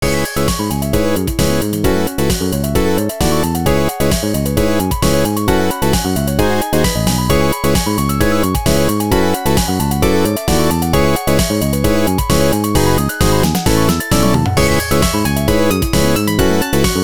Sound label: Funk; Korone Box